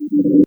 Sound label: Synth Shots; Vengeance Samples